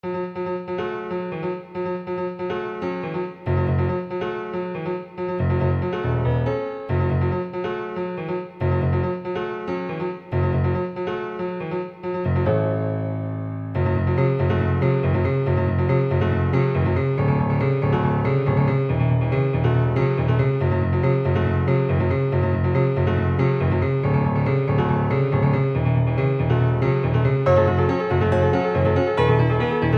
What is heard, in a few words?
piano noises